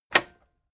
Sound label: cassette eject release heavy